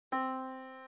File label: yamaha Disk